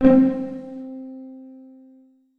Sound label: Yamaha SY-35 Plucked Strings C5